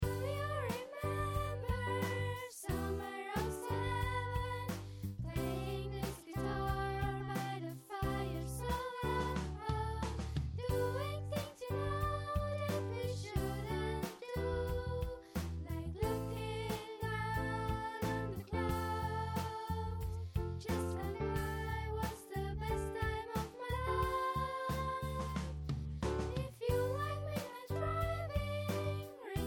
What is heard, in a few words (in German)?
Sopran